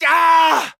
Scream

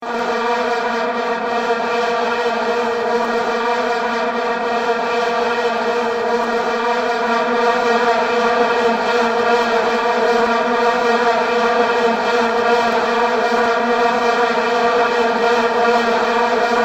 Vuvuzela

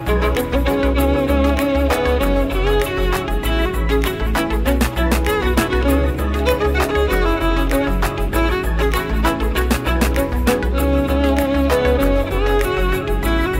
violin

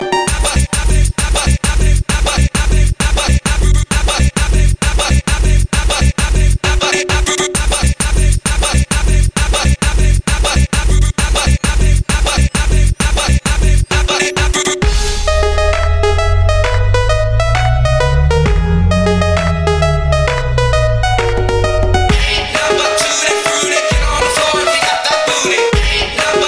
Tekno Tribal

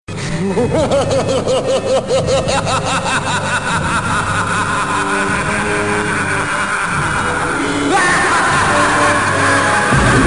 Predator Laugh scary

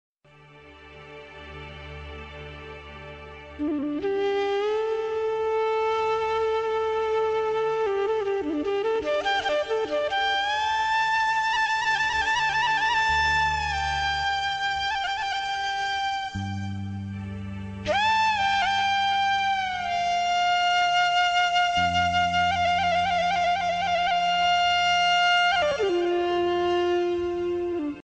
Flute Rhythm

